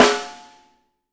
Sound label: snare, LUDWIG SNARE